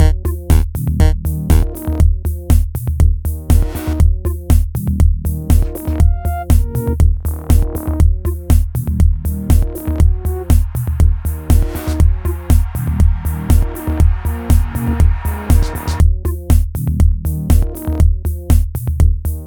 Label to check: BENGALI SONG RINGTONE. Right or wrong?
Wrong